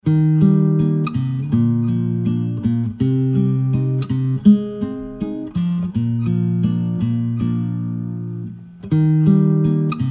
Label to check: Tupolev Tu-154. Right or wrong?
Wrong